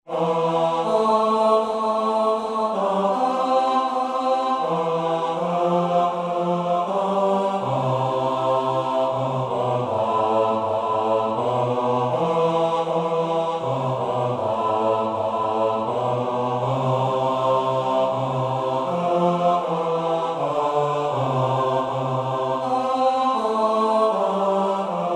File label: Bass